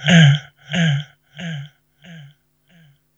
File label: VOX; HHFX 89